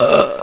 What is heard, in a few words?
burp; Kid Vid Grid